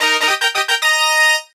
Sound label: Jingle Level Up; Puzzle & Dragons Gold